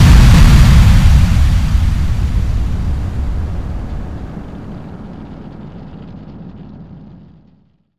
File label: explosion, Weapon Explosion